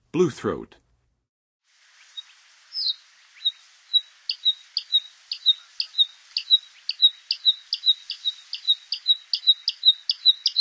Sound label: Bluethroat, Songs of Birds of North America; Western USA; Western USA Songbirds